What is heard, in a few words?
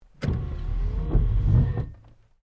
Car Toyota Starlet wiper single; Toyota hire car in Japan; Cars; Vehicles